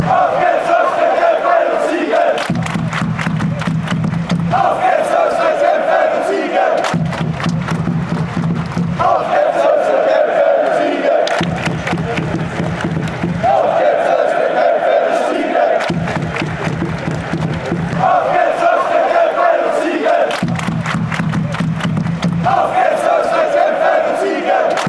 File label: fight and win, fan chants